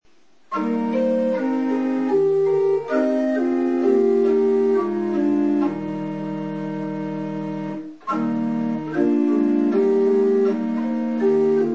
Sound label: Hymn; Hymn 331; Pange lingua